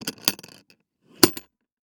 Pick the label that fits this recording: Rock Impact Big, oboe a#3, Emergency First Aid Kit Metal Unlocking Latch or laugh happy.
Emergency First Aid Kit Metal Unlocking Latch